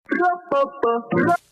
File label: blub buh bub buh